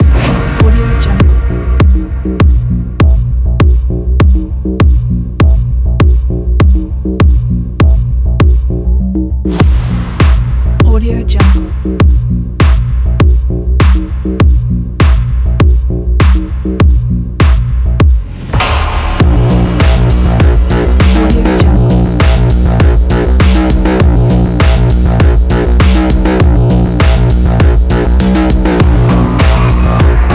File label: cyberpunk legacy